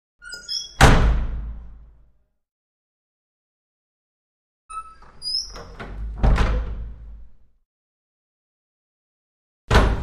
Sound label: Small Wooden Door 2 Op